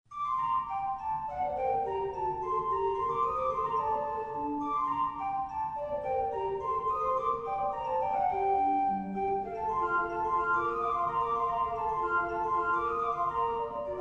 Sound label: Flute Piece